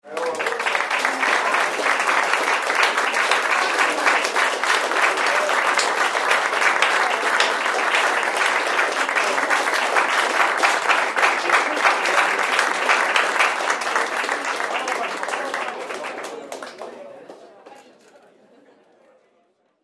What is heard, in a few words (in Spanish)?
ovación